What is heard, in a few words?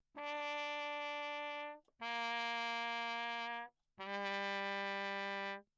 TRP MUTE Bach low; Perinétventiltrompete